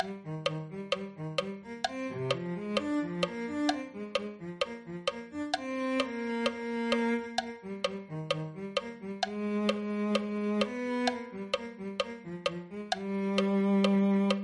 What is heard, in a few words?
cello